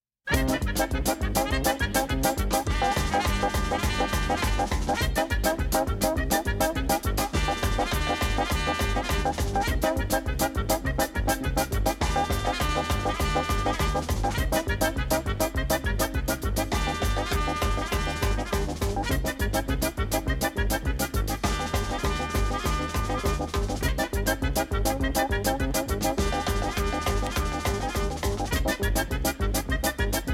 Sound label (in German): Stimmungen Stummfilm